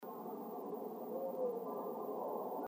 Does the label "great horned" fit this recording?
Yes